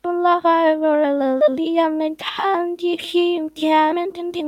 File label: eee singing